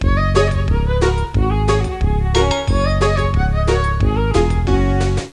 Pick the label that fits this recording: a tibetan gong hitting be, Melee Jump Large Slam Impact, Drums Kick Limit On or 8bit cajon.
8bit cajon